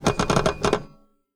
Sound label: Typewriter